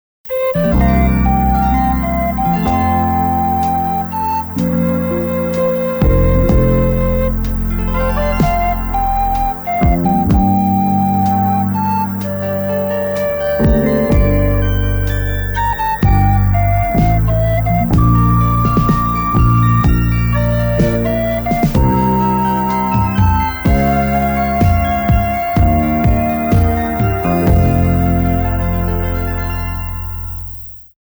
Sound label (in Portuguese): Trilha Flauta; IpanemaFM; Spots Agencia Jinga